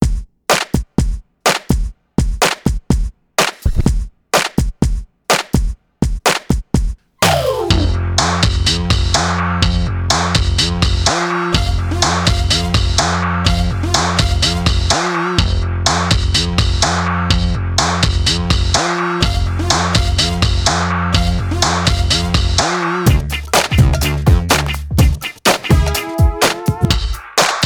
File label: Recess Party Instrumental; Network Production Music; NM306 The Playroom